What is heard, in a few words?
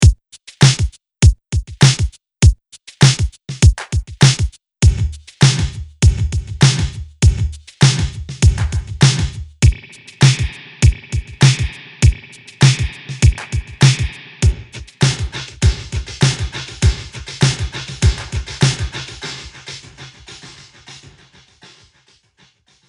80s beat; logic pro; space designer